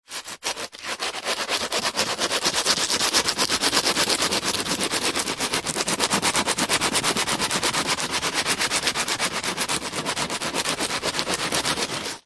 industrial sanding large piece of wood sand paper fast